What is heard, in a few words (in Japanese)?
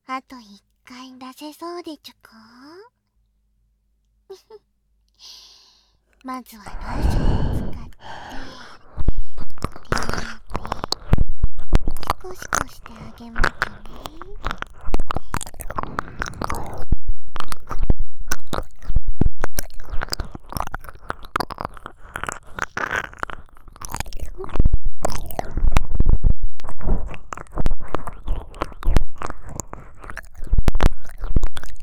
音圧耳舐め, くちゅ音SE無し; 日本語翻訳版; 宇宙人による音圧耳舐めと赤ちゃん言葉セックス; 音圧ゾリゾリ耳舐め